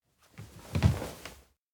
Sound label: sit chair; anemoi